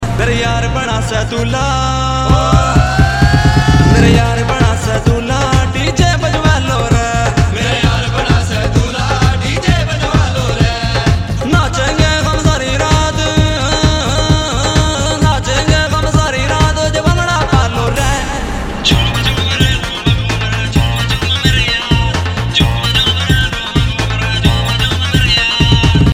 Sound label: Ring Tone